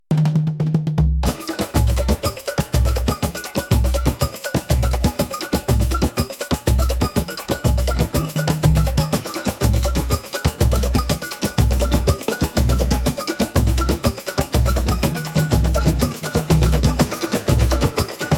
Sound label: Batucachat